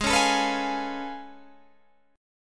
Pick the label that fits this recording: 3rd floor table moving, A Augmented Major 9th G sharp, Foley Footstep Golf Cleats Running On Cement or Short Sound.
A Augmented Major 9th G sharp